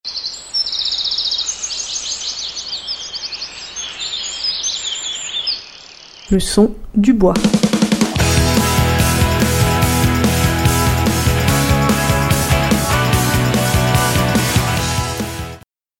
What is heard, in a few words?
jingle